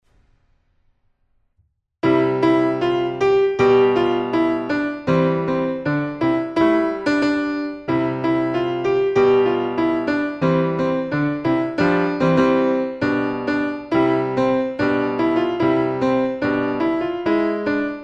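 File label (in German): Beethoven Freude Schoener Goetterfunken, Klavier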